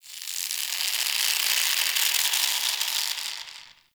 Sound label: Under City Rope Creak